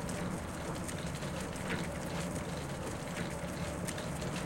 Cart; Game rip; MiSide

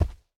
Step Enemy; Game rip; MiSide

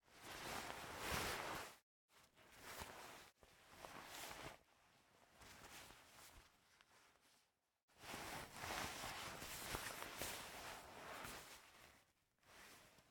PushUp Fall; raw game audio files; Mila; MiSide

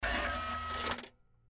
Check Tape; Game rip; MiSide

